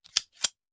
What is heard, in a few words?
Hand Gun Foley; Sound Ideas; Guns